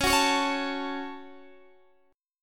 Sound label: C sharp Suspended 4th Sharp 5th, Guitar, DADGAD